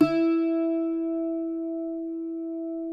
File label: cuatro E; Spectrasonics; Hans Zimmer Guitars VOL-2